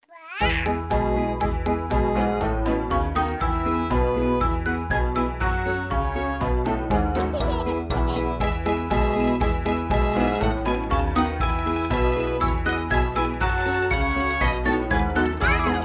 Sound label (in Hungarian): kemence